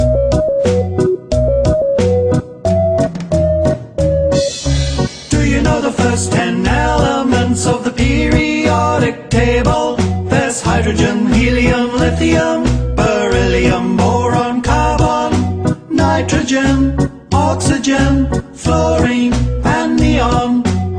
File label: Periodic Table Song